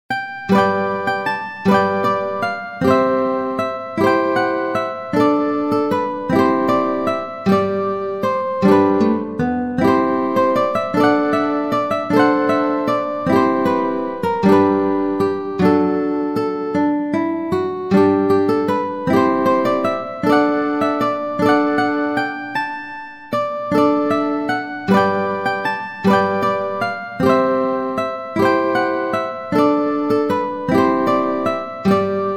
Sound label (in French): guitare solo